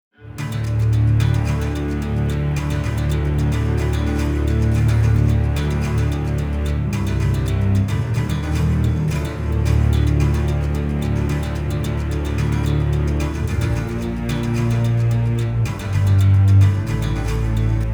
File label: Monster Hunting Combat Layer intro; The Witcher 3 Wild Hunt; Skellige